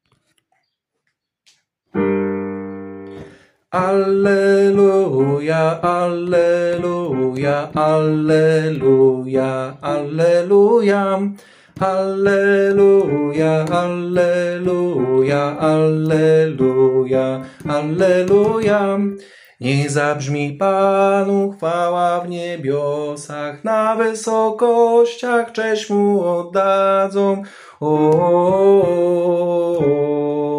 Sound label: bass; alleluja niech zabrzmi Panu; nagrania glosow